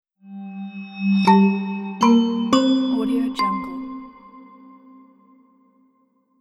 atmospheric intelligent logo

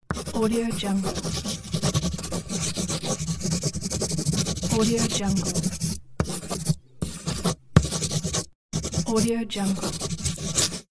pencil drawing; legatopromusic

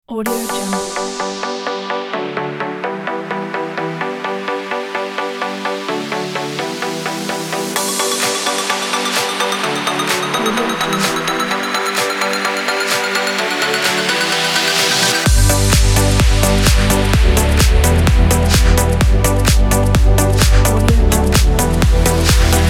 electronics

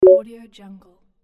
basic confirmation

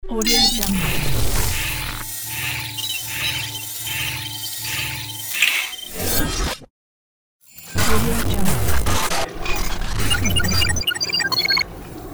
robotic device

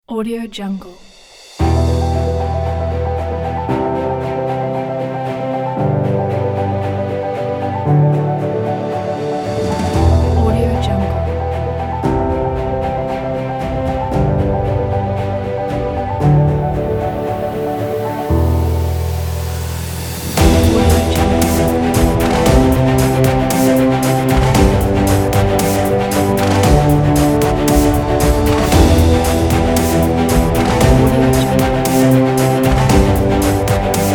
motivate epic cinematic

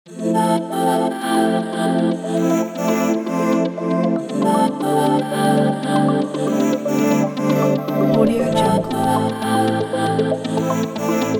dreamy dance; dopestuff

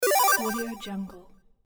retro 8bit video game confirmation menu sound; tony-parsons-music

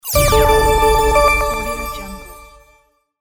futuristic big win; gamechestaudio

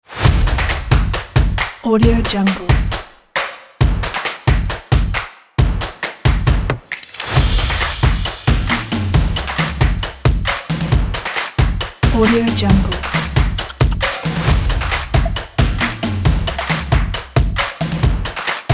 cool clap percussion beat

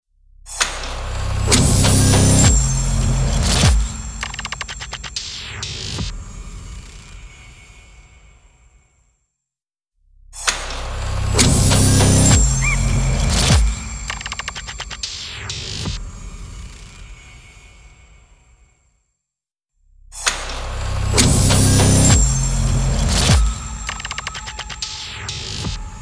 strong logotype intro ident

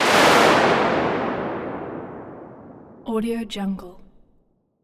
lightning bolt

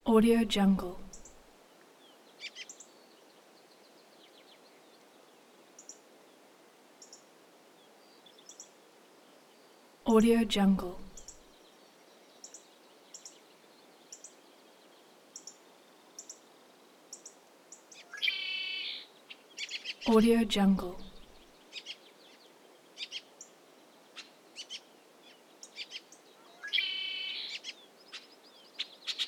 dawn birds

